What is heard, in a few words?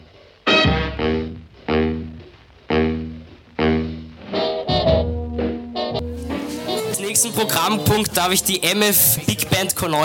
BIGBAND